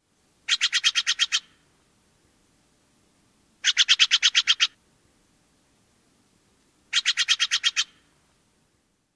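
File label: rapid-fire calling, Steller's Jay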